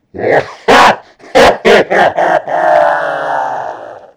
demon moohaaa laugh; demon voice

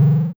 boss prehit

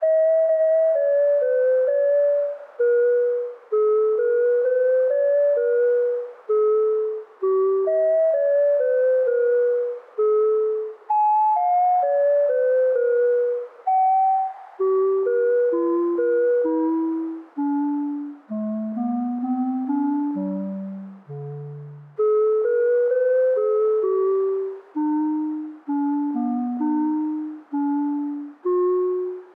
creepy, Creepy Music; Creepy Music for RPG games

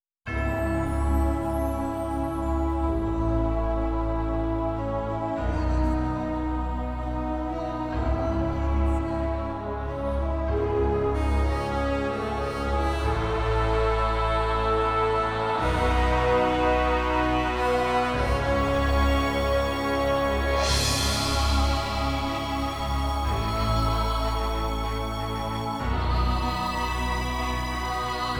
orchestral; Fall of Nightmares

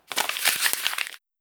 Paper Crumple Crumpling Scrunch Crunch Sfx

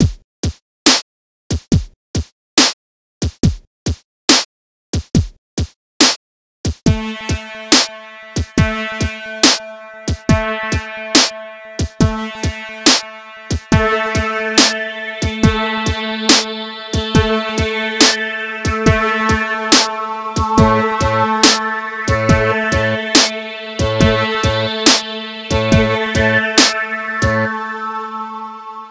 Trance Dance

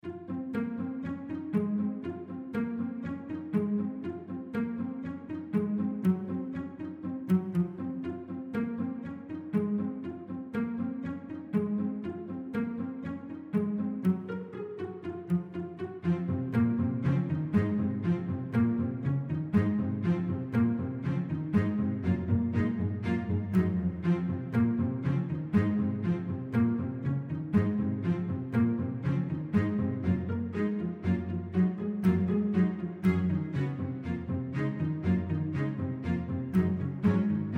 forest tension

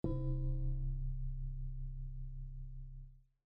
bell

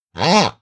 pig hit

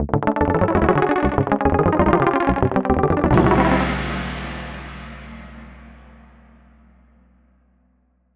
Game over jingle

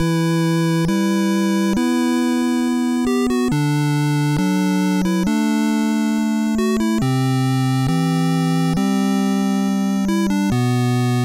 Hero's Lament (8-bit)

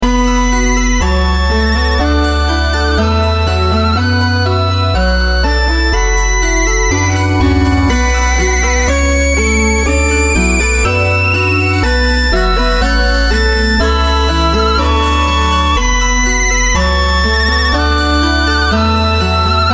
hymn title theme

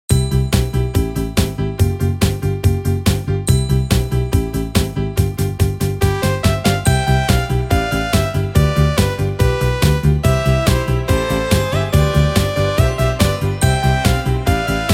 soundtrack

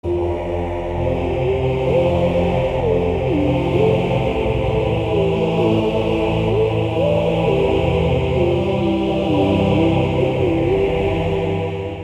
gameover

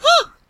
Scream

